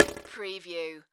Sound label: Multimedia Percussion